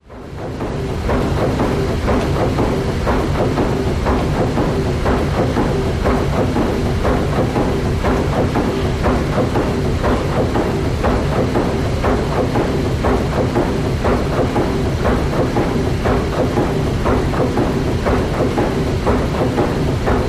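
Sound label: steam engine pistons; WWII Liberty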